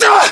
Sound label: pain, english